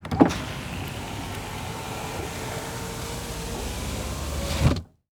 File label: Transportation Car Monte Carlo Interior Power Window Roll Up